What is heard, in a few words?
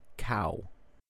Cow